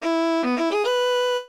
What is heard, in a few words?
level start; phone; haunted treasure